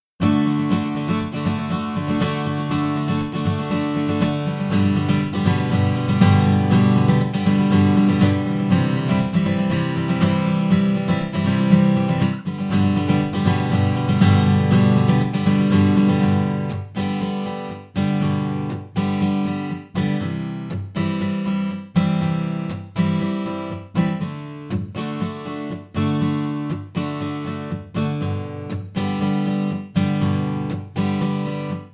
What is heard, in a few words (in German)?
gitarre ohne